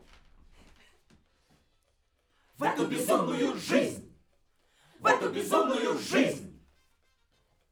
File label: Backs SCHOEPS